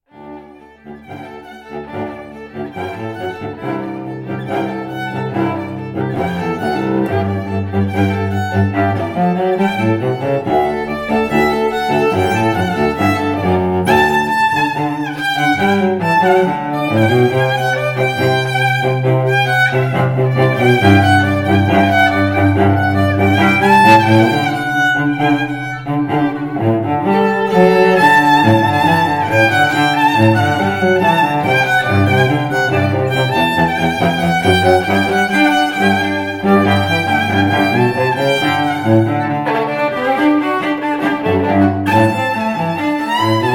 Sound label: String Duo